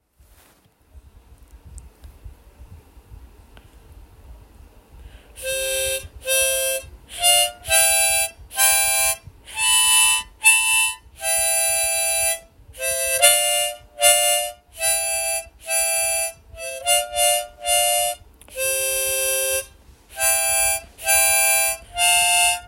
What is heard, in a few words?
Harmonica, Twinkle; voith usa